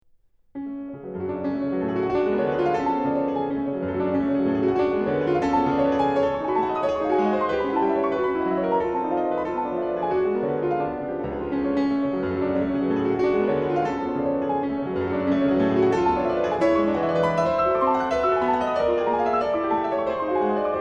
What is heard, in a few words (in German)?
Prelude fis moll